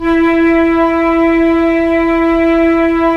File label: Flute, Roland LA Composer; Roland - LA Composer vol. 4; 90 s Sample CDs